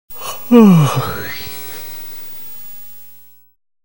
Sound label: man yawning; SOUND EFFECTS1